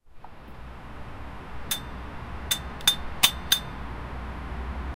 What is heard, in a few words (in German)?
einen sound wenn etwas fe, construction